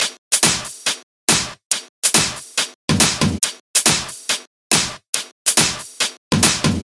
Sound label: Drum Percu